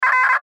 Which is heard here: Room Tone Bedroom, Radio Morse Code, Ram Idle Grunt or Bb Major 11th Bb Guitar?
Radio Morse Code